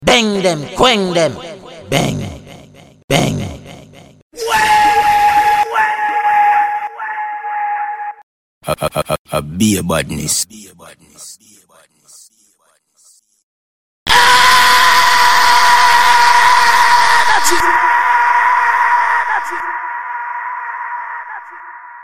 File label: vocals